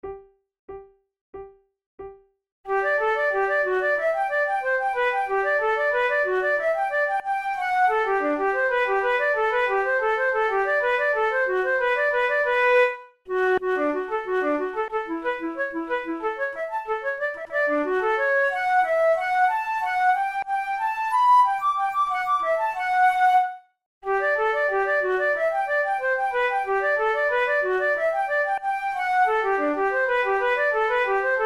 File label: horn i've been roaming duet, flute